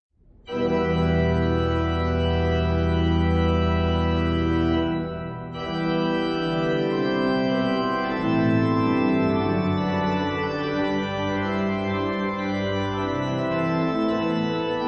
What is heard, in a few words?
Canzona per basso solo; la Trombocina; Girolamo Frescobaldi